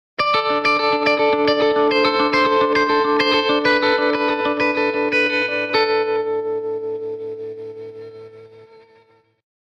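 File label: Electric Guitar Rock Arpeggio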